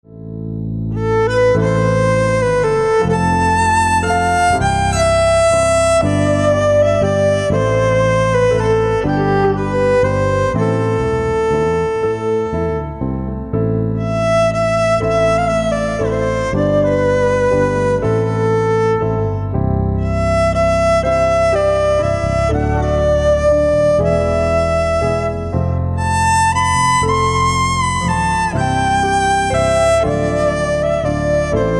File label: The Lark piano violon